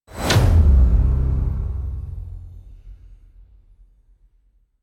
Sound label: opening transition